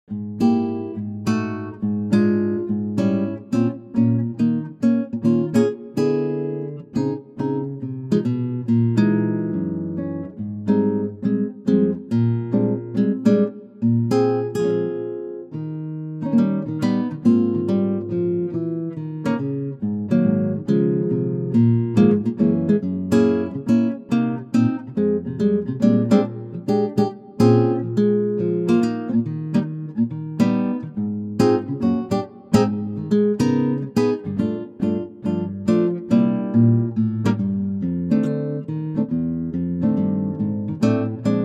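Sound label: Acoustic Guitar Ab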